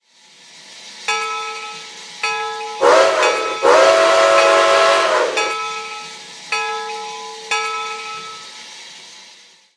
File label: Nathan 6 Chime; RailPro